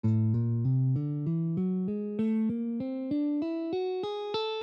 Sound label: A Neopolitan Major, guitar